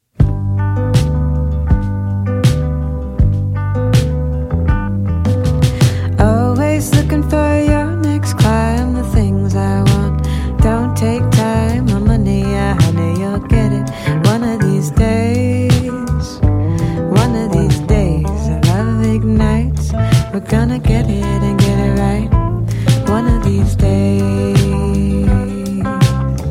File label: chill pop